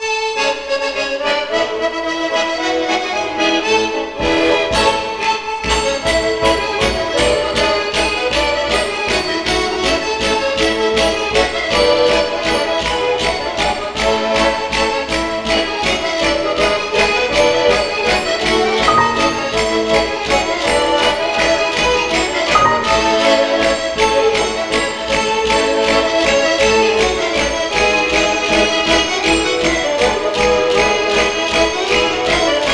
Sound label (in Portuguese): Polca e Mazurka